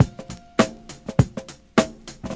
102 ping beat break, drum loops and breaks; 101 to 110 bpm

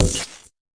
fx drum

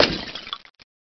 Glass Breaking

